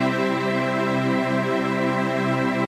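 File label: do major; playback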